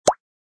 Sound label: click; 音效